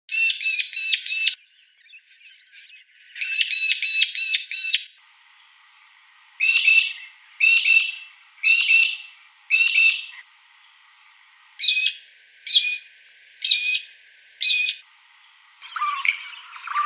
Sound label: Magpie Lark